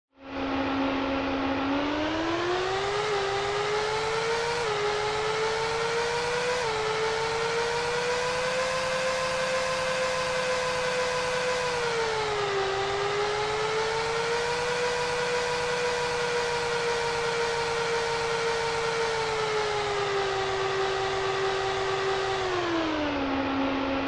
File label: Racing Engine; SNES